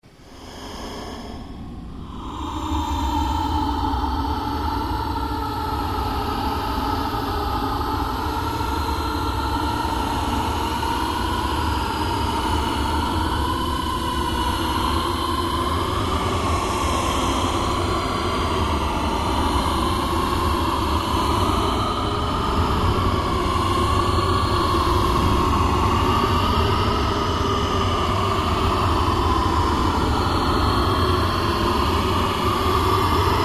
Ambient Drone No Sweep

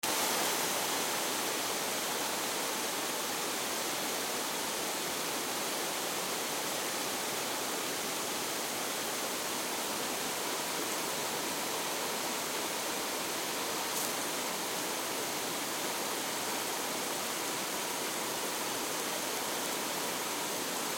Water Spray Garden Hose Cone; Garden Hose Gun; Cone Setting; Hissy; Spraying; Objects; BigRoomSound

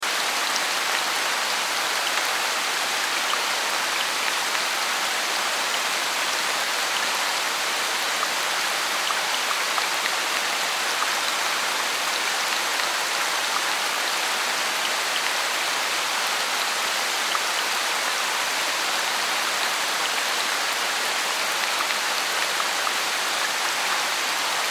Forest Rain Heavy Loop; Nature; Ambience; Blastwave

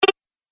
Multimedia Double Button; Andrew Fleming

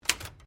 Typewriter Single Key, Old typewriters; Typewriter 1950S; Typing Sound Effects; Typing (Sound Effects:Offices)

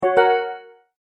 multimedia tone start; Sound Design

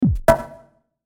Musical Drum Hit, Musical Drum Hit Synth; Drum Kit; Blastwave; Sound Effects:Musical

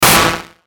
Hit Electrical, Hit Electrical Glitch; Electrical Button; Blastwave

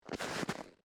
Snow Single Slow, Footsteps

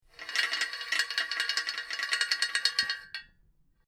Metal Chain Pulley Rattling Chains; Chain sounds

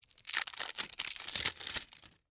Plastic Wrapper, Foley, Open, Seal; Plastic Sound Effects; Plastic (Sound Effects:Materials); BlastWave

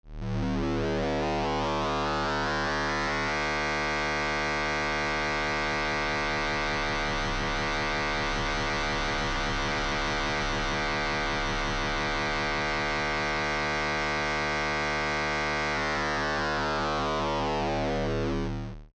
Synth, Stab, Ascend, Steady, Descend; BlastWave

